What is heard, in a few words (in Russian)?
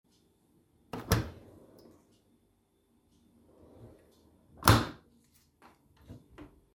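вариант посудомоечной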